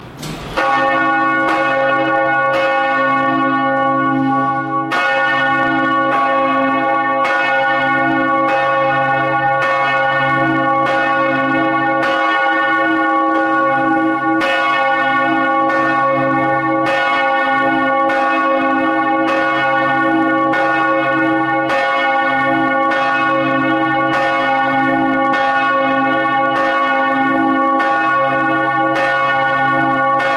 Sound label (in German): Glocke, glocken jakobi kirche; Marienglocke